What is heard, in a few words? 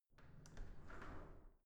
entrance door open